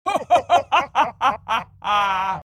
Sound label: Evil laugh; Mom Vox Evillaugh